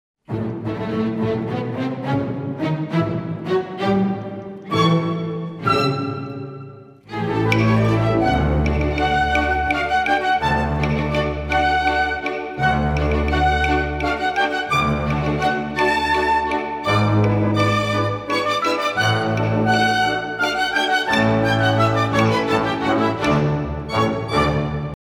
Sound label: Paquita Male Variation SHORT FAST